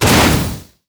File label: Hit Light Volcano Add; netent